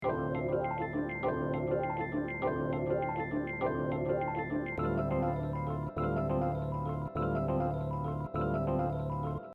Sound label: rhodes loop